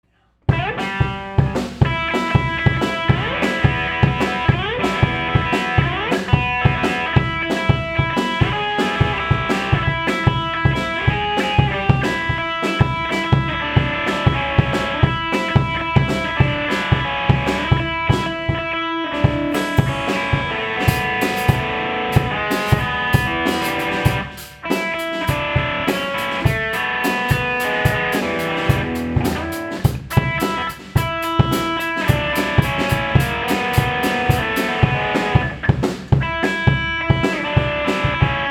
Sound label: new drum beats